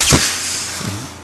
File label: Future Gun Shot Sing, futuristic